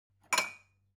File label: Taking a glass; phong bep